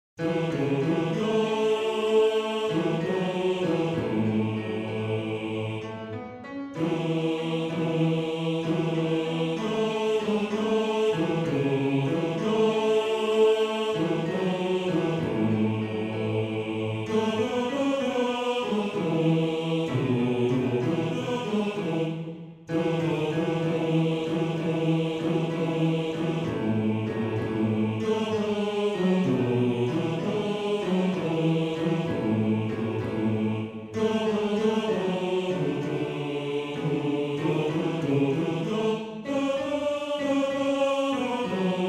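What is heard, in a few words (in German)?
Bass 2, Männerchor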